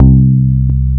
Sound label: Bass Note C